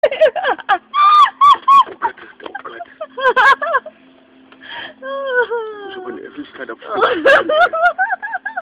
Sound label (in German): lachen ringtone